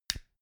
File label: stick lighter light up flame